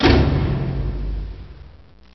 Low Orch Snap

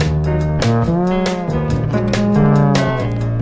140 question that bass piano answer; SAMPLED MUSIC LOOPS

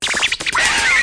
scratchout fill; Scratching and Turntablism; DRUM LOOPS and BREAKS

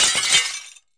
glass break

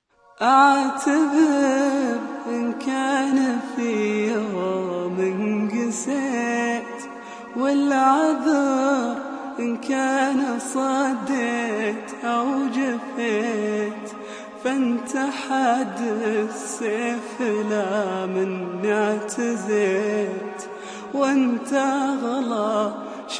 middleeast male vox; MALE SINGING

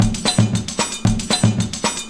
114 play latin, drum loops and breaks

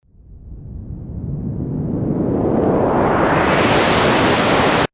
noise Modular, Noise Sweeps

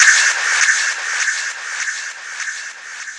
slide noise break; Weird and Interesting Hits; single hits

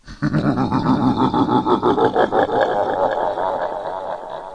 nice evil low demon laugh, male; MALE MISC and SPOKEN WORD

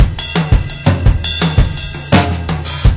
084 jazz, drum loops

